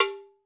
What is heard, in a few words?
Guernsey, Alesis D4